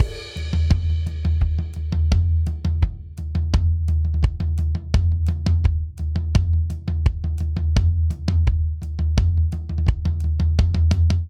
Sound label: Toms Pop Verse Variant 85 bpm; 4 4; MIDI Clips; Ableton; Factory Packs; Drum Booth